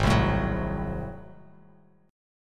G sharp 7th Flat 9th G sharp down Bass Standard, chord; G#7b9; strummed; JGuitar